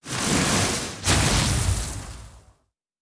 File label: Lightning Spell, Magic Sounds; Fantasy Sounds Bundle